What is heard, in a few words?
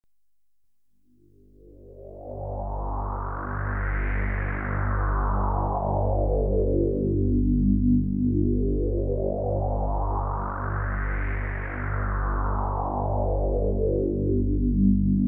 Variable Pitch Harmonic Hum, Electronically Generated Sounds; BBC Sound Effects Library